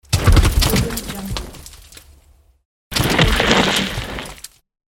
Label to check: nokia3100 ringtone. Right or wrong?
Wrong